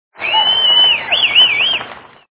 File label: cheer whistle